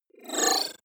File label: disappear flicker; maschinen; signale; soundpool